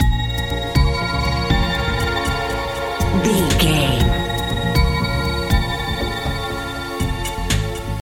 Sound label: mysterious video game expedition loop